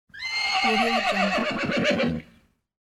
horse whining

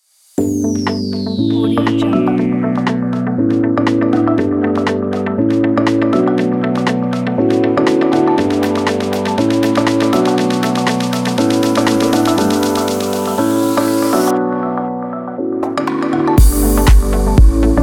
upbeat tropical house

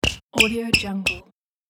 shoes squeaks

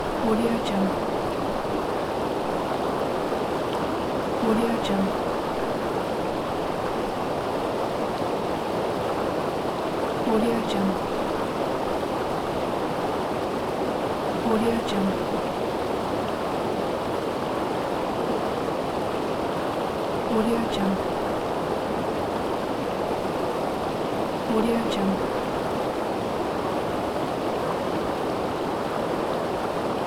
a waterfall

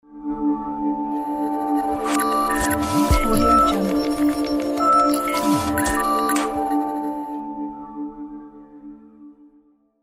tech logo; fruit-tartlette

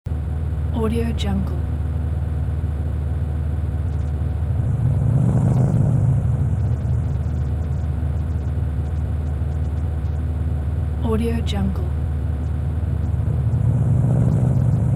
sports car idle to away

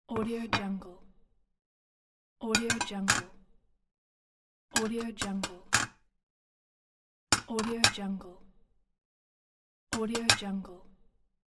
stacking plates

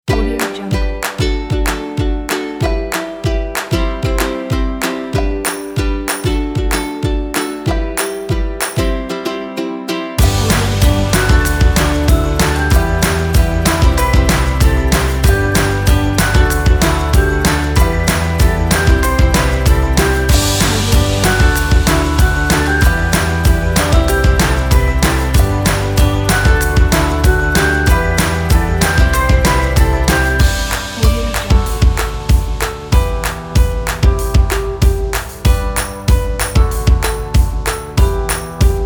happy and fun

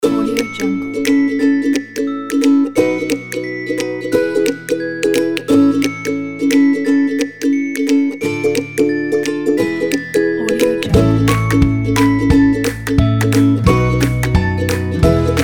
happy

